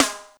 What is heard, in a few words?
Snare Rock